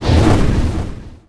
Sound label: magic fireball fire